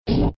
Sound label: GAMBLE END; Sizzling 6